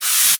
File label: Aerosol Can, Bathroom; 1001 Sound Effects